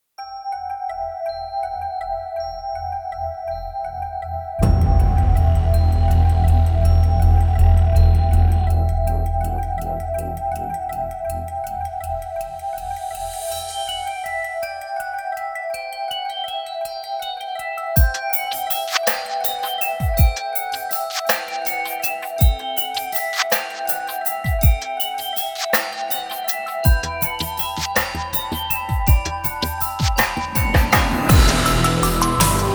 BREAKBEATS BELLS

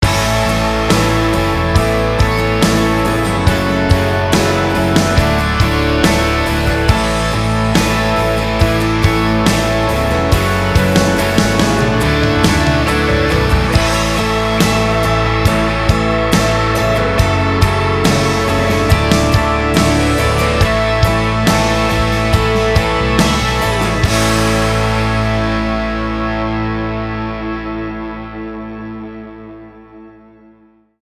instrumental

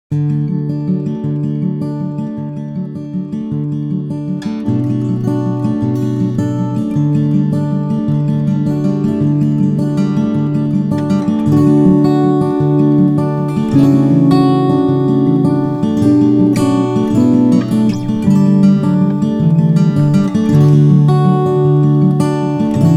INSTRUMENTAL

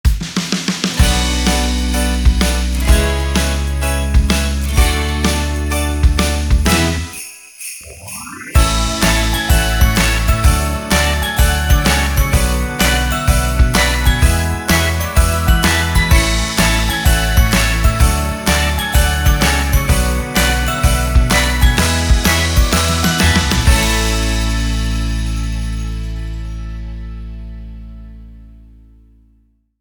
(INSTRUMENTAL)

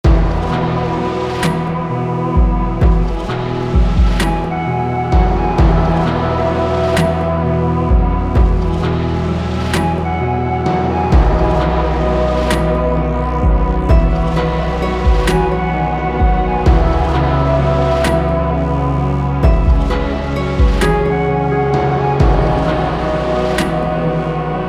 (INSTRUMENTAL); welsh language singer songwriter acoustic folk

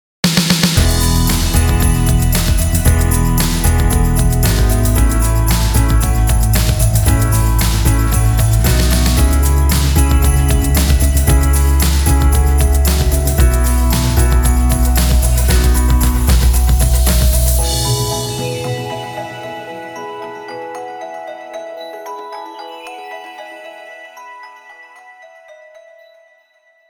INSTRUMENTAL

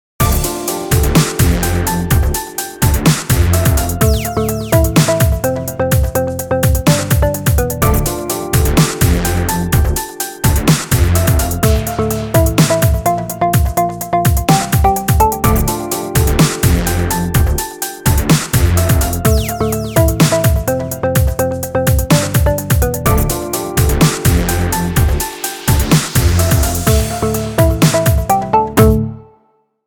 halftime dub mix

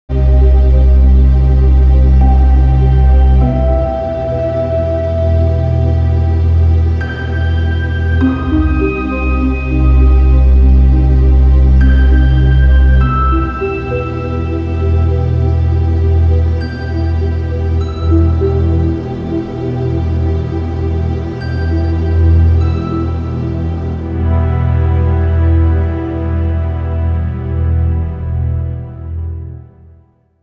DESCENDING WINDS

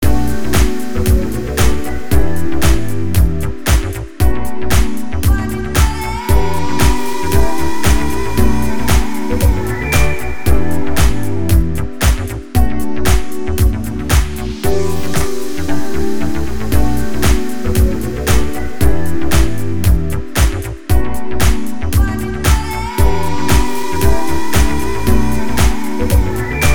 DANCE FLOOR

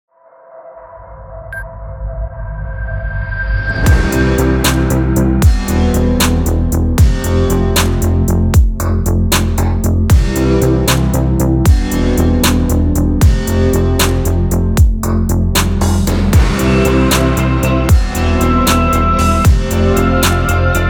INSTRUMENTAL

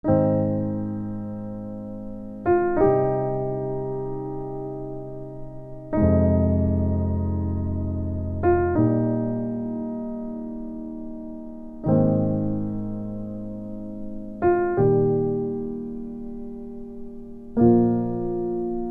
MUMS PIANO

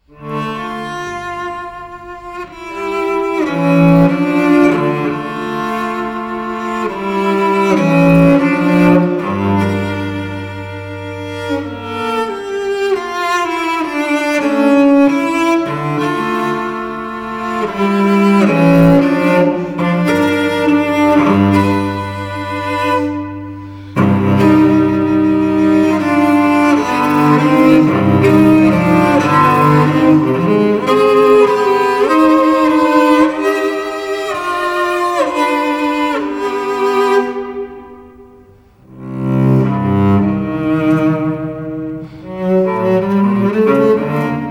FIRST MOVEMENT

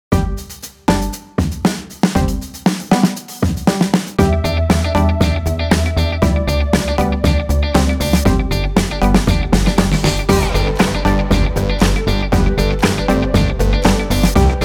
instrumental

